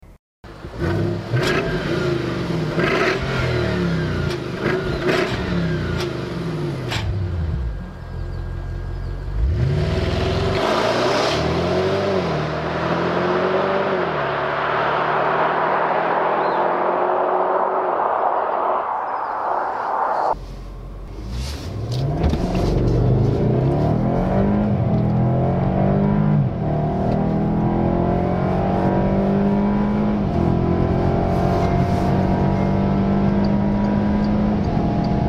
Bentley Continental GTC

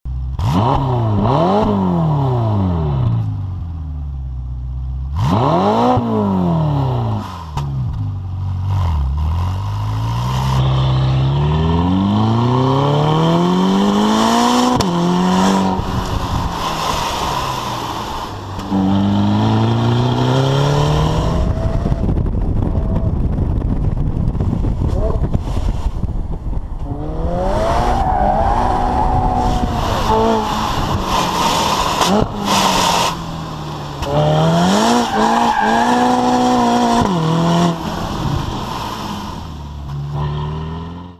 Ferrari California Turbo

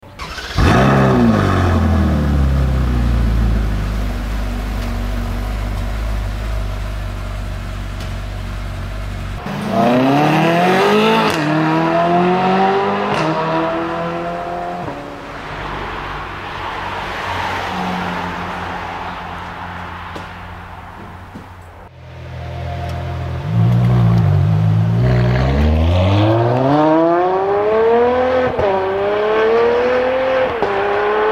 Ferrari California

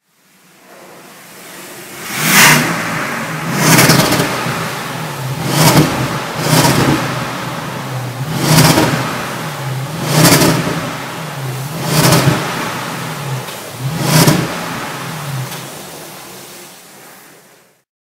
Bentley Mulsanne engine

